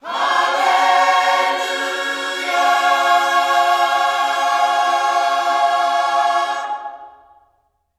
HALLELU, Gospel; HALLELUJAH

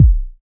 BD, Synth; Sound & Vision - Gigapack II CD 2

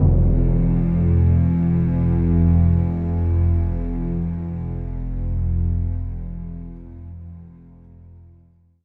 bass endings; Orchestral Loops

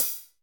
Cym The Edge, Alesis D4

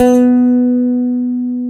stick, Chapman Stick; Analog Odyssey

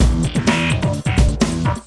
CB LOOP; Zero-G; Chemical Beats

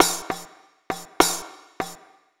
Riq, Acoustic Beats; Sonic Foundry (Sony Creative Software); Myths of Technology

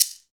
Shakers

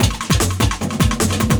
LOOP, 150 BPM; Ueberschall; Drum & Bass Flash Trackz

